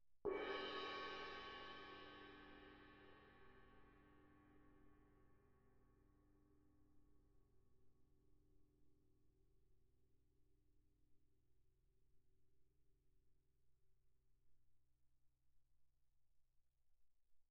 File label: crash mallet mf, suspended cymbals; Percussion